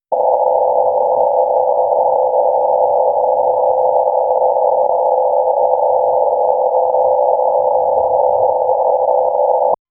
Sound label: a short 0.5 second ui erro, error